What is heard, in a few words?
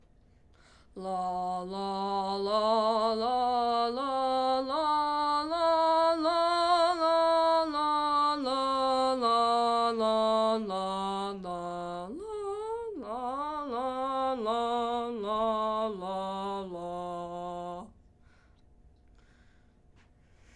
Tenor; allstate2024 jrhigh